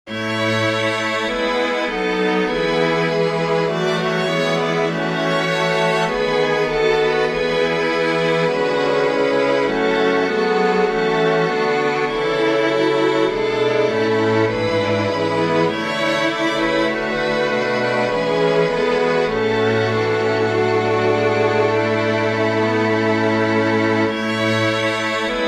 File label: for string quintet; Johann Sebastian Bach; prelude liebster jesu wir sind hier